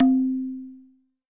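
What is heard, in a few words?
TABLA right; WorldSounds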